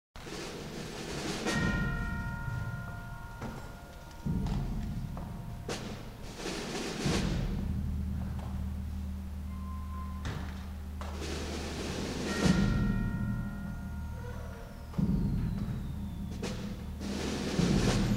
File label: Taps; 4th of July 2018